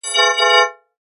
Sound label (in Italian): ciottoli zeitverz; acque